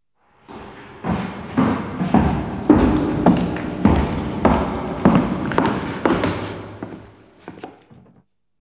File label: Walking In A Graveyard; Halloween Sound Effects